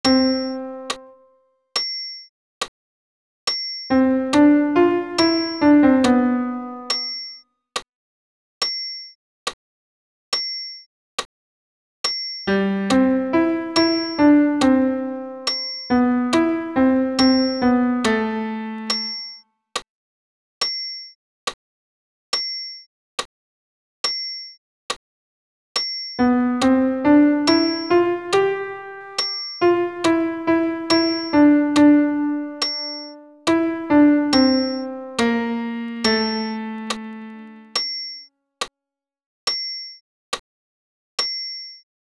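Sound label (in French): Ite missa est ténor piano seul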